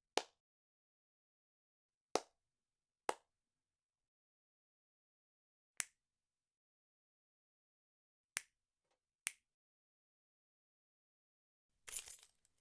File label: claps and snaps; stresstest